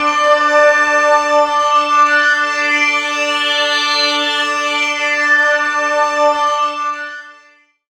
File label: Drone, Pads; Jungle; Jungle Warfare 1&2